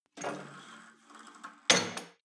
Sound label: cable machine pull and drop full, Fitnessstudio; sport freizeit